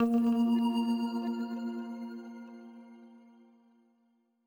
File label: Achievement Sound; Spectrum